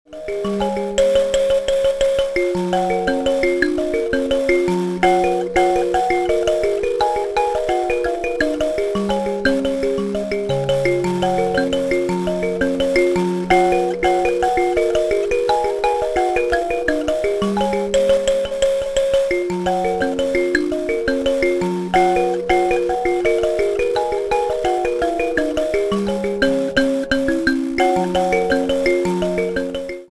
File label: mbira